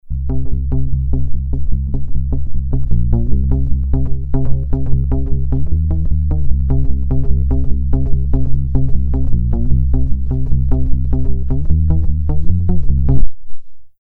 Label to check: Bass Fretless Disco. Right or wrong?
Right